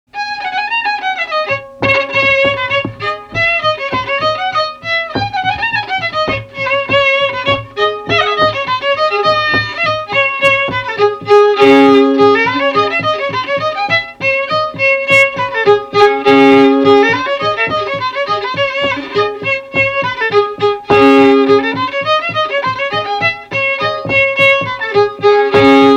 Polka; Le Quadrille des lanciers

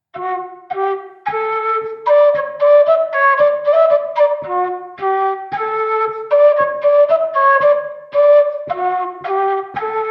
Ronde; Ronde de Rochat

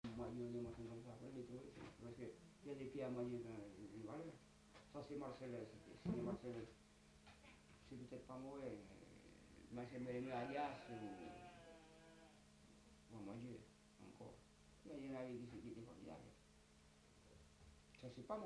Sautiere; As tu vist lo reynal; Auxerre a Angoisse

